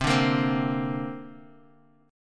C 7th Suspended 2nd, C, down, Guitar, Half Step Down